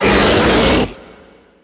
beast vocal fire barf

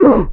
filter hurt

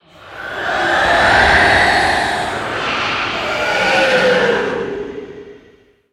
beast ghost rise

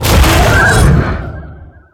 lazarus flip dead

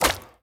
bounce medium; fistula

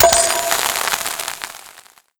golden bomb

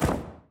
chase flap; fallen

